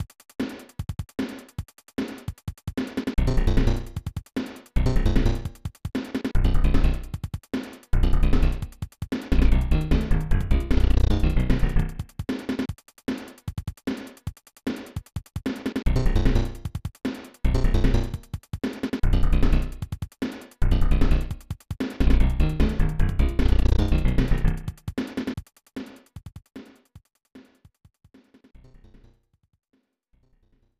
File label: Hurry Up! Underground BGM; SMB3; Super Mario All-Stars; SNES